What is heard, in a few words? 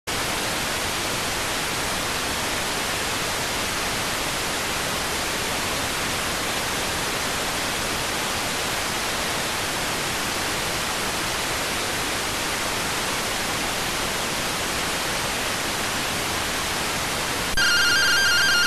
rtty rx